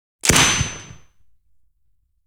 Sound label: L7A2 GPMG 7.62x51mm belt fed 1m in front clean Single shots tracer